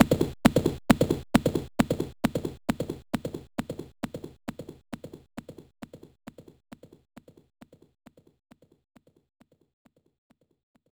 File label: Horse Gallop; Ninja Burai Densetsu; genesis